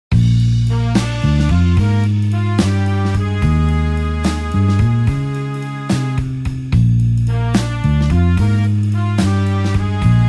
blues horns slow Bb

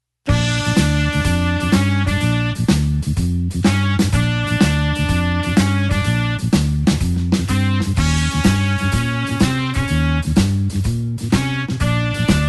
blues horns shuffle Bb

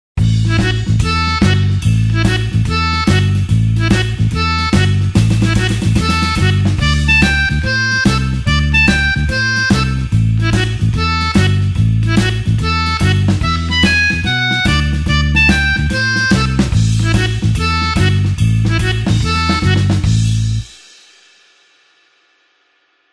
blues harp shuffle A

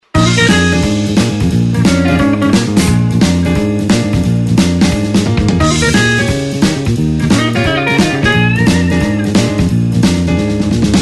blues shuffle solo Bb

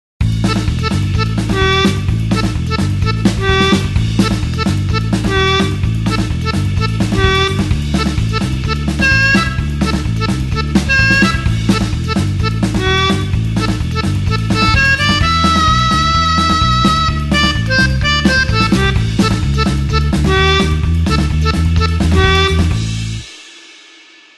blues harp 8beat A

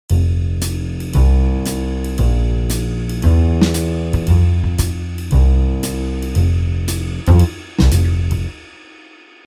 jazz 2 beat

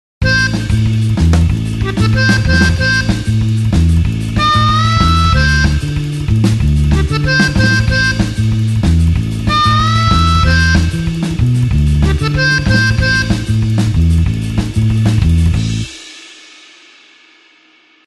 blues harp 8beat A

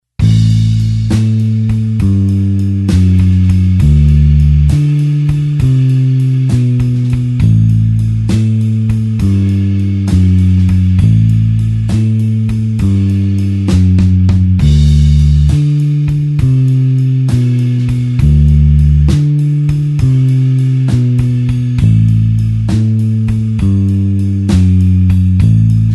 blues bassline slow A

